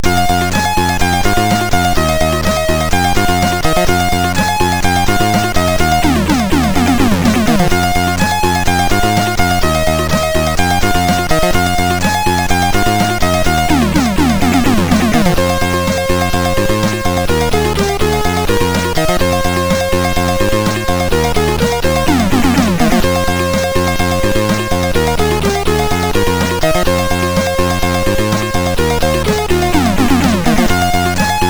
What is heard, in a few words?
sid MOS6581R4